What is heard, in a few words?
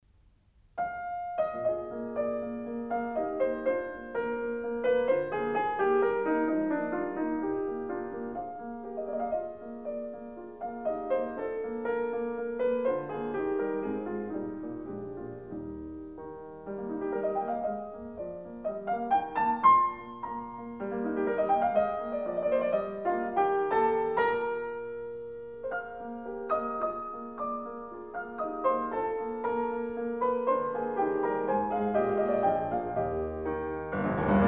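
Piano Sonata No. 4 in E flat major; Wilhelm Kempff; Beethoven; Piano Sonatas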